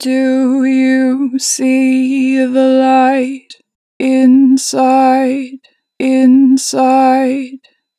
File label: Natural Vibrato, Vocals, 4 bar vib; Inside; Harmor Vocals